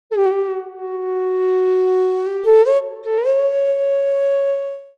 bansuri

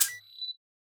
celly shaker; nova sound

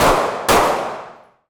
double 357 magnum revolver gun

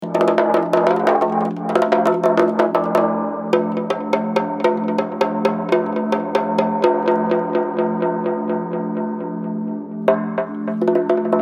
space bongo

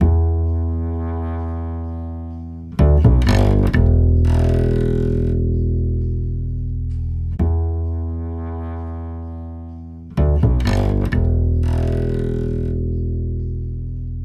expand bass

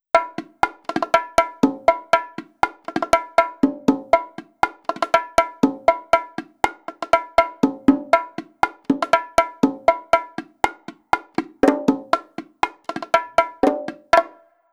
bongos single hits groove

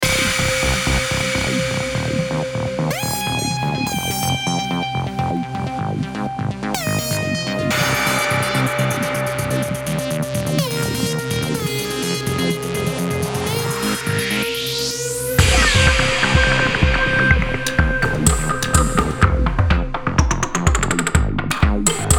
dance orchestra

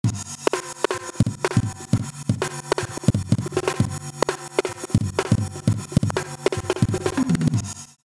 drums

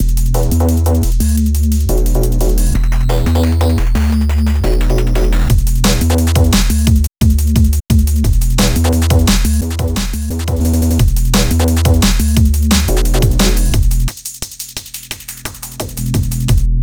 drum

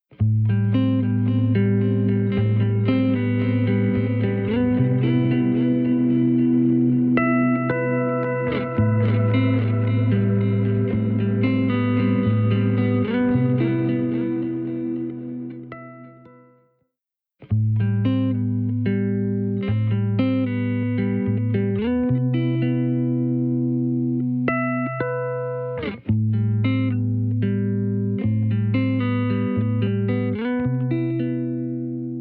recirculate big pingpong

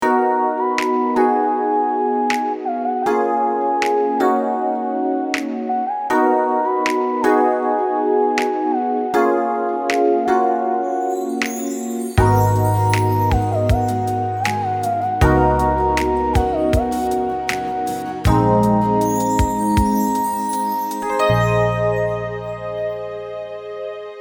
rhodes

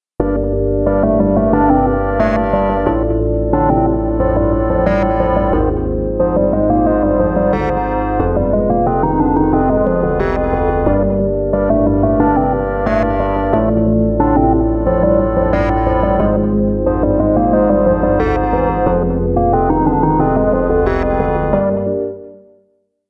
pad panic attack

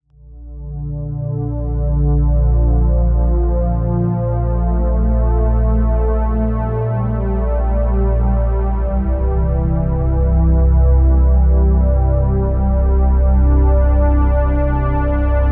spectrum augmented ambience

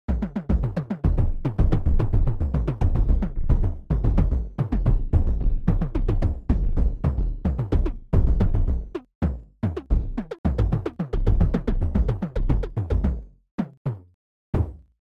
indian percussion kanjira khan

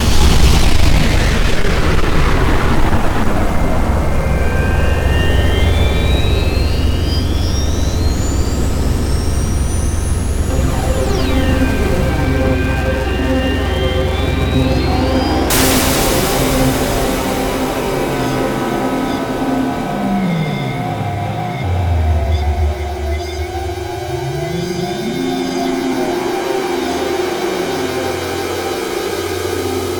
airburst sfx

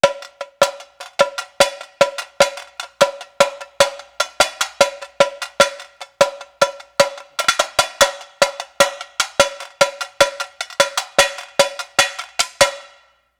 tamborim single hits

